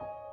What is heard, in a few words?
piano high